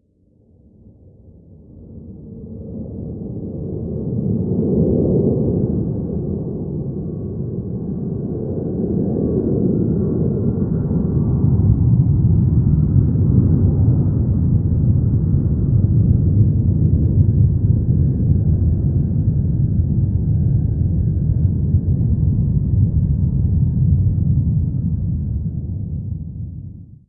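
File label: jet flyover interior; ModernWarfare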